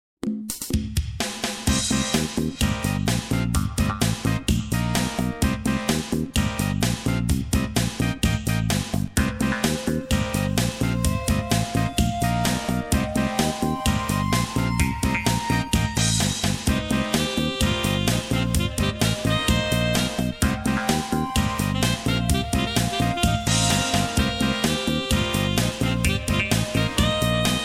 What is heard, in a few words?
Jazz